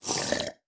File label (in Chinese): 流浪商人饮用挤奶声